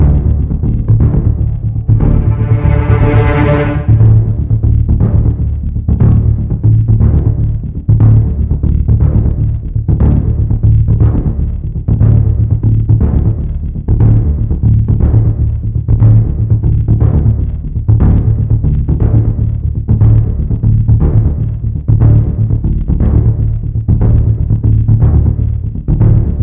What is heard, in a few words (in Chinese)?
追逐; 猫鼠追逐 bgm